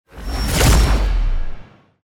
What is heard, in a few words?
Epic Hit; افکت صدای ضربه سینمایی